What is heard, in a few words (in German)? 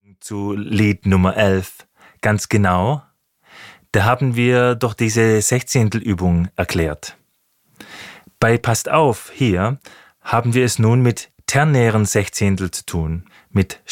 Rhythmus